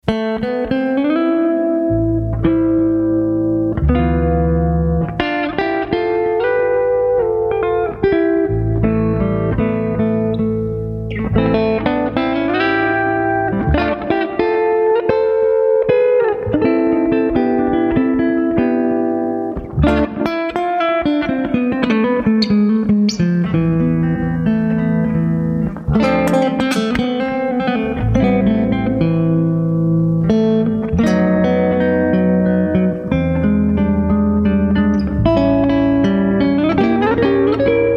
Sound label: GUITAR SOUND